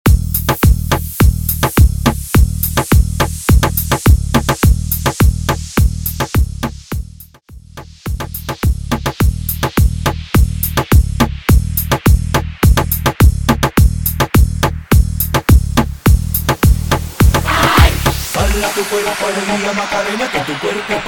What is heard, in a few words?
Moombathon Remix 105 BPM